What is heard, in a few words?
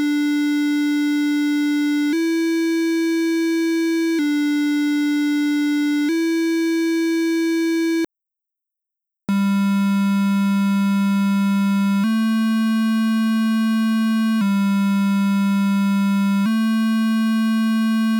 chainsaw noise